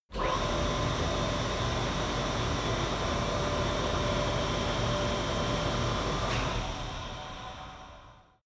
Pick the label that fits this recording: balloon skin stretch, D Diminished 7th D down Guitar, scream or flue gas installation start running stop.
flue gas installation start running stop